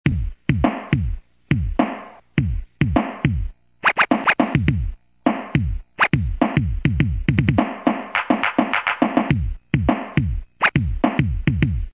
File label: Elevator; Genesis; TJ&E